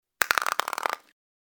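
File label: skeleton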